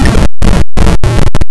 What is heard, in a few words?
vort scream; Keen 2